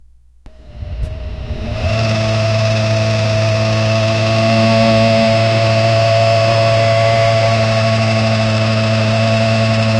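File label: classic scooter at highway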